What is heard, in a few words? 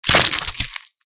zombie massacre head smash